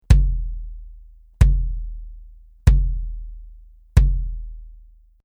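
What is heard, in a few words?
BASS Ctd Reso Hard Plastic, bass drum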